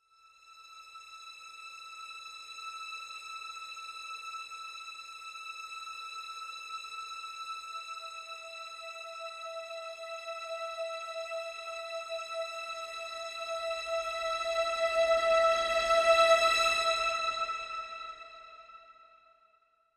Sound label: Simple String (E)